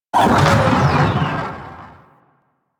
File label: Space Fighter Clone Destroy; Warframe; Enemy Ships; Space Fighter Sentient Coil Agent